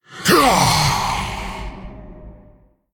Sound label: Male Elemental, Death